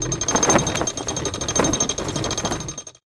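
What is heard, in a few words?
curtain death; monster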